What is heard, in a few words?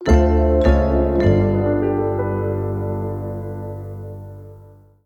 Bg Level Lose; Ellen's Garden Restoration; android